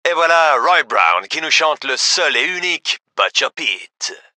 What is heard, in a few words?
music